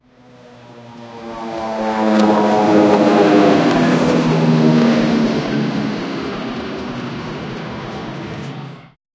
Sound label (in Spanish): avion despegando